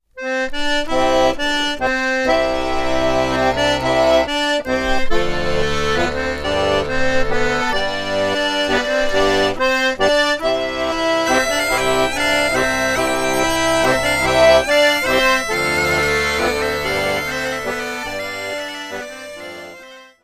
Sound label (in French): Bourree - France